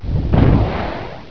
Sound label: STRIKE